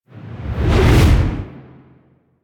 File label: Fall Whoosh; Foley; Warframe; Tenno